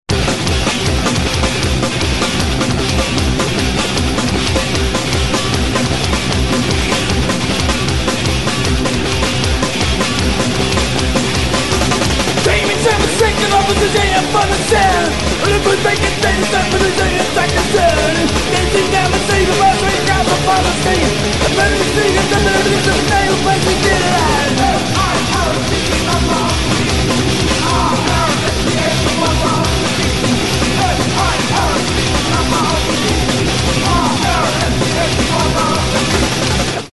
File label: ska